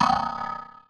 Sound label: Percussive Hit, contact mic